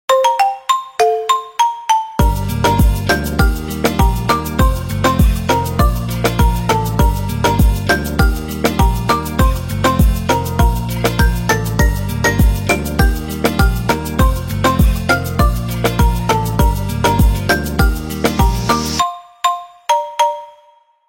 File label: Marimba Remix